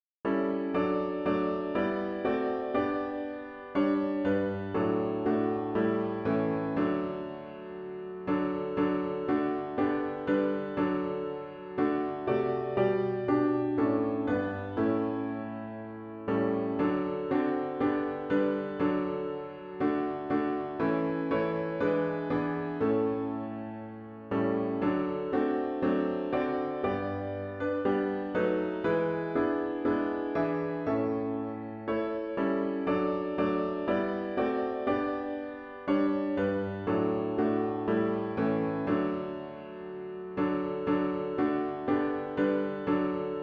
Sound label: The Day of Resurrection piano with Intro